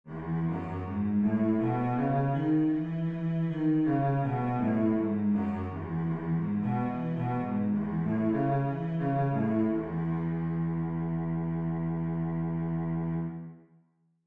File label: cello E major scale